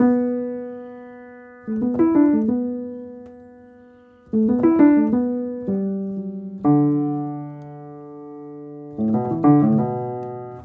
piano riff dry no airspace; airspace hybrid reverb and delay; modeaudio

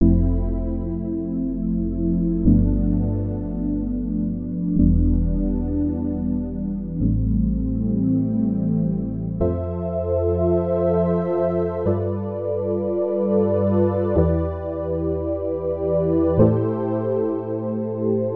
mellow organ; orbinator; audiodev blog

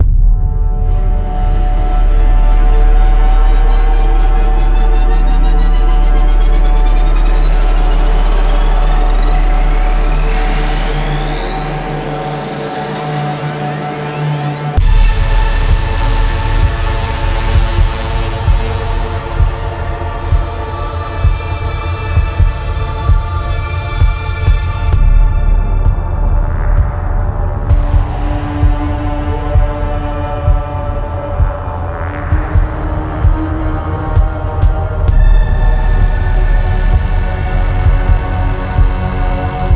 vital cinematic ambient presets; dune 2; golden screw studio

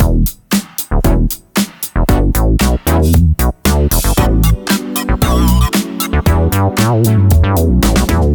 bass; synth bass center by audiolounge soft

